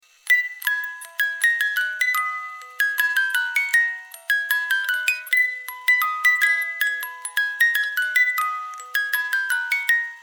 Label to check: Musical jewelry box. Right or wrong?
Right